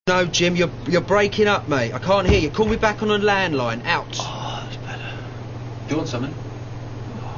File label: blast